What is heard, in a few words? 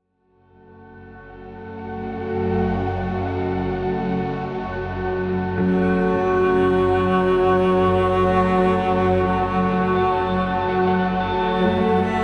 Dark Ambient Cello 80